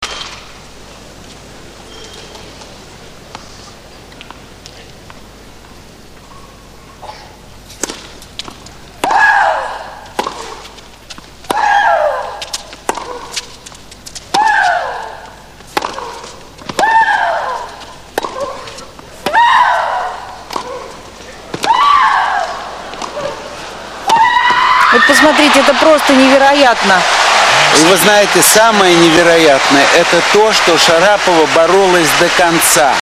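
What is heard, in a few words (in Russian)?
крики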